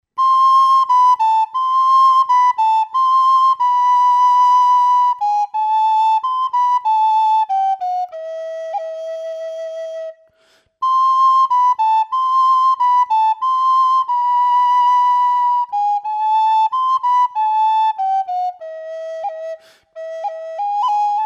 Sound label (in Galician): trad, Pito Galego